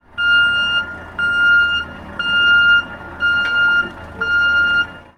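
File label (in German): LKW rückwärts sound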